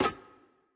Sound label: Monkey Stuck Jaw Latch; Puzzle